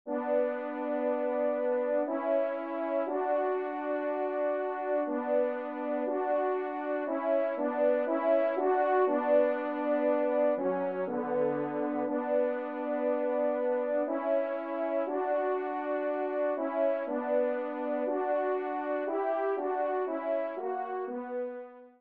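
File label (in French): duo, ensemble, trompe cors; Kling; duo cors n 16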